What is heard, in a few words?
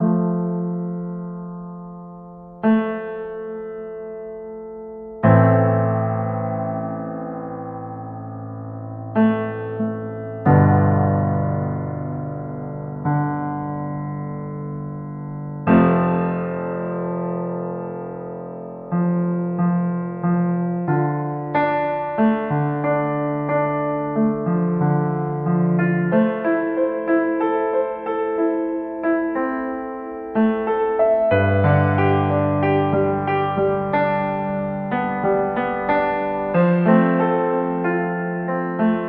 Piano